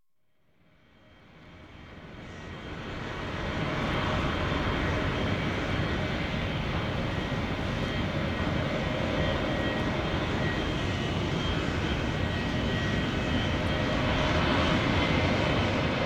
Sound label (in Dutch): Schiphol Platform; KMP Film Video Sound Effects